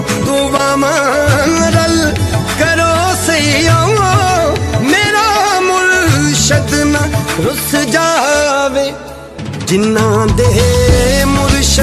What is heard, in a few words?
Punjabi Ringtone